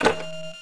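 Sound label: Cab Button, interface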